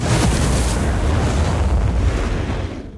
Finalboss Wave fly

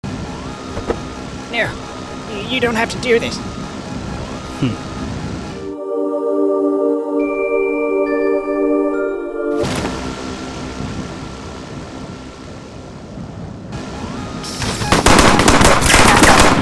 Drop

